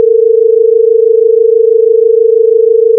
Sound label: minor second